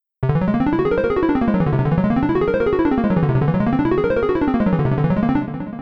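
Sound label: calculation sequence arp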